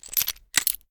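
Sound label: charge reload speedloader, pistol; gmod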